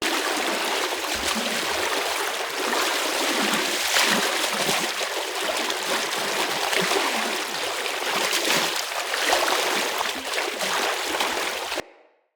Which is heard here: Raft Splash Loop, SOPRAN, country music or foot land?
Raft Splash Loop